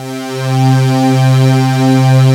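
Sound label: massive, JD8 pads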